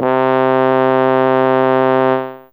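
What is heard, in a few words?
Trumpet Hi C4, Fairlight CMI IIe; Brass; Brass1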